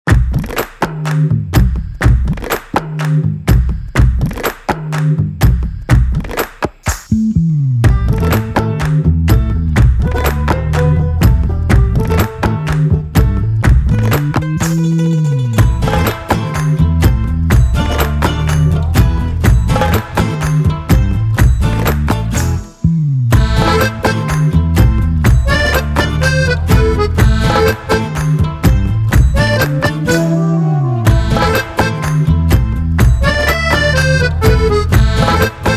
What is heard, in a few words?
Instrumental